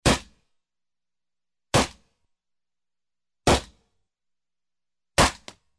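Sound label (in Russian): strelba avtomata odinochnymi ak 47, AK-47 single shots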